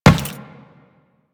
Run Metal, Footsteps; Enemies; Warframe; Teshin; DE

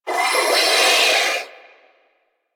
Sentient Death, Barks; Enemies; Symbilyst

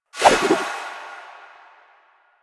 Kunai Whoosh; Warframe; Weapons; Choir

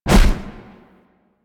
Dash Hit; Powers; Warframe; Focus; Operators

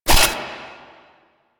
Shotgun Quick Reload Layer; Warframe; Pax Duviricus

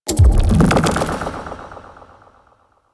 Sacrifice Vitruvian Device Slide; Warframe; Quests; Chamber

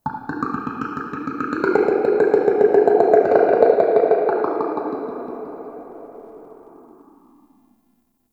Day Croaky Creature; Ambience; One Offs; Glades Of Andurin

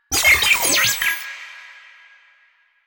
Sniper Drone Vocal; Warframe; Lasrian; Lasrian Drones

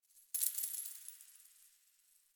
Coin Drop

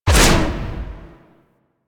Melee Heavy Attack Short Whoosh; Warframe; Weapons; Whooshes

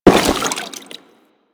Crewman Step Water, Footsteps; Warframe; Corpus

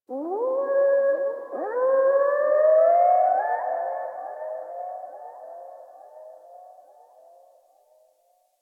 Distant Zone Two Creature; Warframe; Ambience; Eidolon Plains; One Offs

